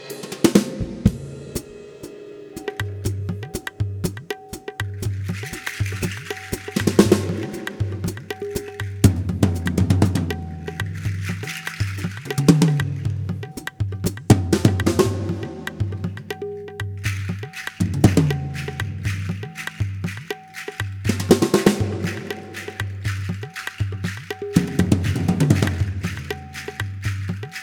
Fin Rythmique

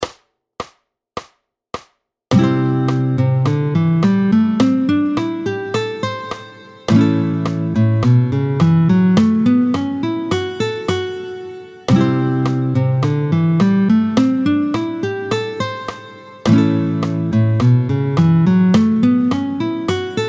de Do a la majeur; les pentatoniques mineures et majeures les i iv v mineurs et majeurs

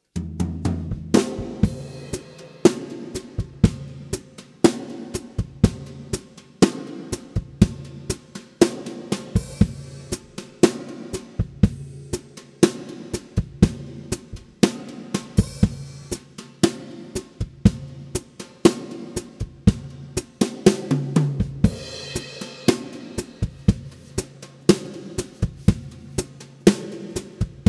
Batterie

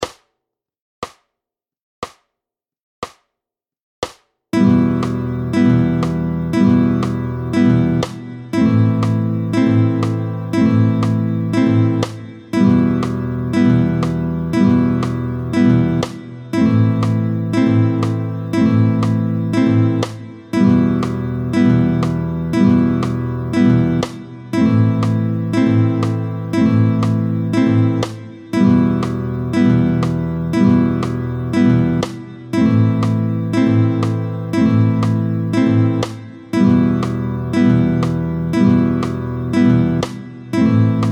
Croches contretemps 60, Les croches à contretemps, battement tempo 60

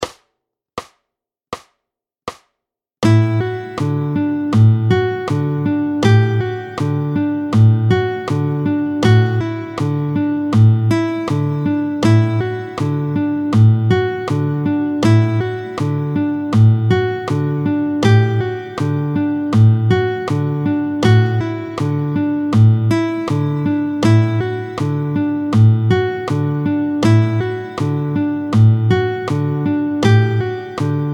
hammer, tempo 80; L’hammer qu’on voit danser; Les effets guitaristiques : slide, hammer, pulling of, bend...

